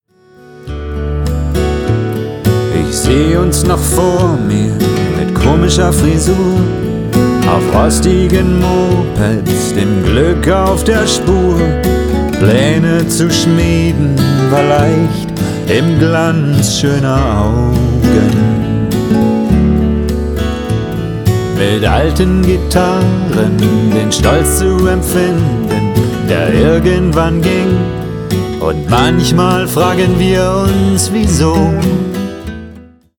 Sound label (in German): Mit alten Gitarren